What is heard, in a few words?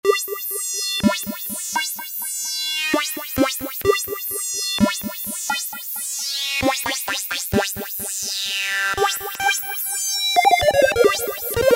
Reverse Lead, Korg microKORG; Factory Voices